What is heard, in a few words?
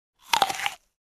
Fruit Bite